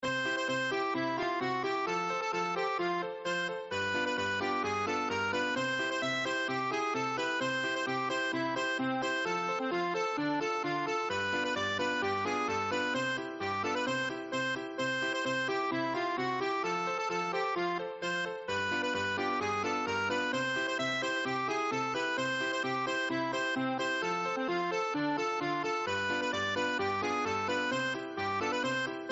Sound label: arpeggichrome polka